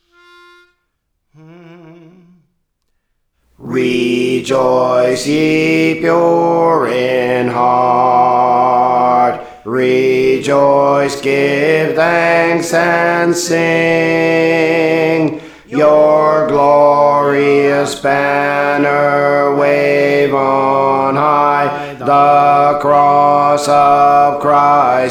Alto, Praise For The Lord Training

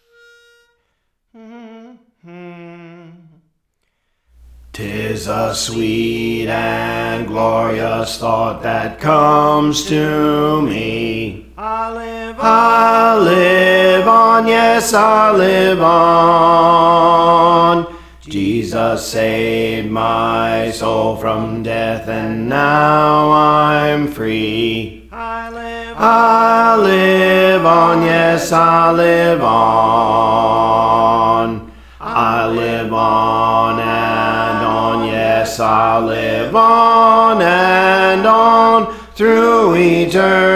Bass; Praise For The Lord Training

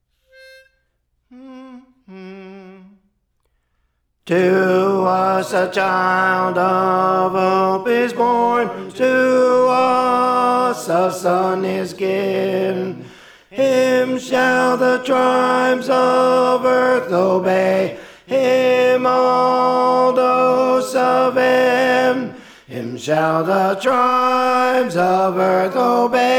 Tenor; To Us a Child of Hope Is Born; Praise For The Lord Training

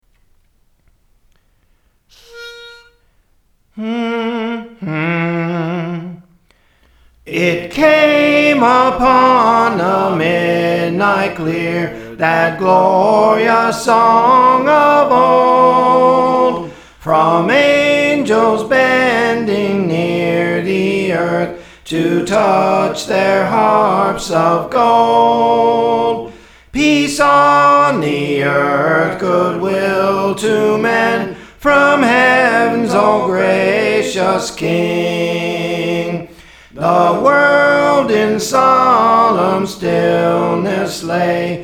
Soprano; Praise For The Lord Training

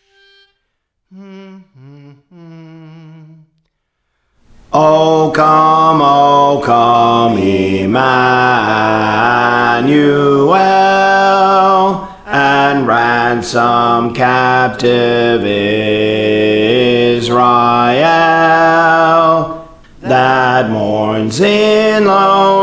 Bass, Songs; Praise For The Lord Training

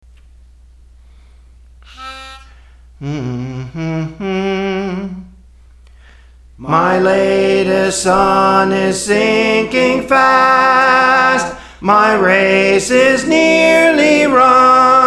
Soprano; Praise For The Lord Training; My Latest Sun Is Sinking Fast

